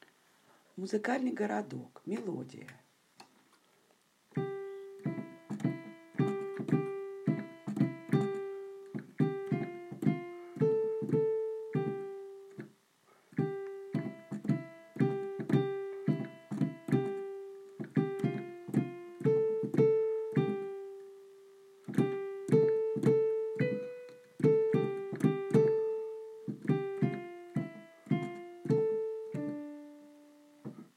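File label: music box town melody slowly; Muzykalnyj gorodok melodiya medlenno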